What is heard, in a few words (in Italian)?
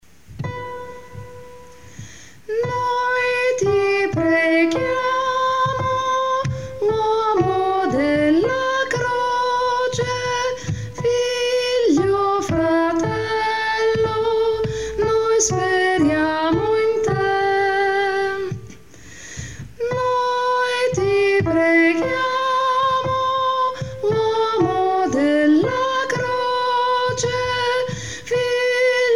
noi ti preghiamo uomo della croce soprani